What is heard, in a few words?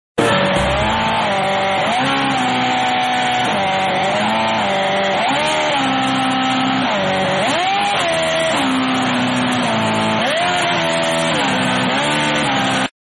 motorcycle